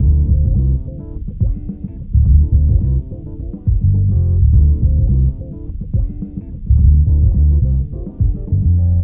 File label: Ambient Living; game rip; mad global thermonuclear warfare